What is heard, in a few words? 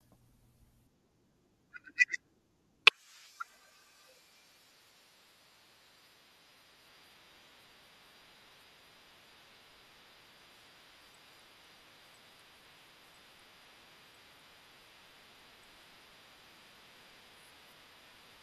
duck phonk